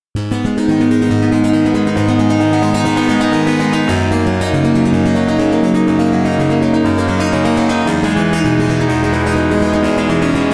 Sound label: stereo guitar; ringtones